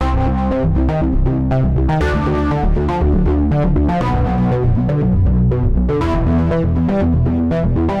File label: Arp Sequence